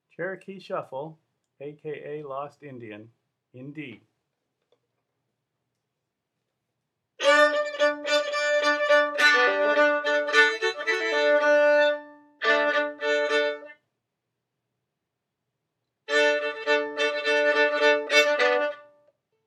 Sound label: D, fiddle; Cherokee Shuffle